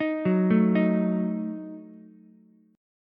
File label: D sharp Diminished D sharp Ukulele; arpeggiated; D#dim; 8,6,5,6; JGuitar